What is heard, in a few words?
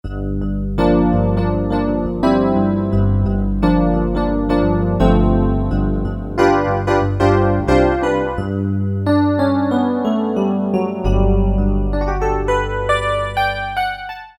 Electric Piano; Electric Piano 1